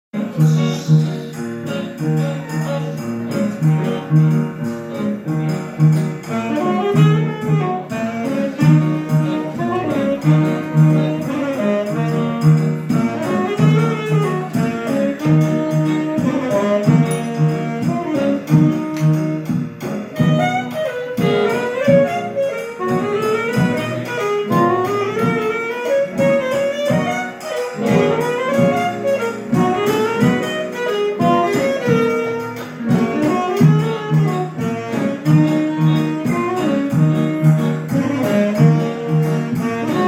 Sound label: Jazz; recital poetico musical dando voz a las mujeres; día internacional de la mujer